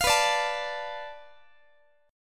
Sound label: B-Minor 7th Flat 5th B down Guitar; strummed; 4A,4E,5A,5D,5G#,6C#; x,x,20,21,18,20